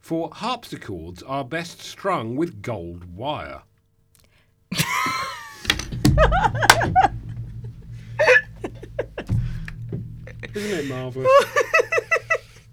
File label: Harpsichords; For Harpsichords Are Best